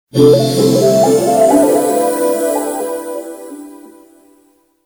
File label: Nice Pad; Soundzabound